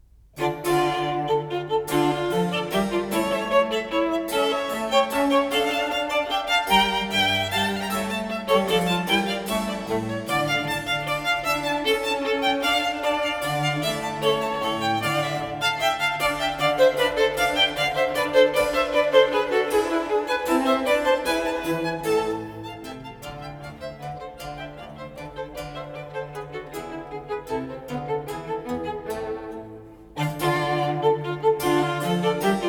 Trio Sonata For 2 Violins And Basso Continuo No.8 in D minor; Vivaldi